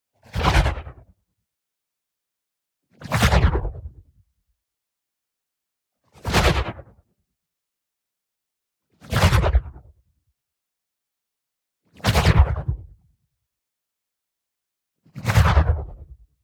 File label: WHOOSH SMALL Simple By; boom library; Matter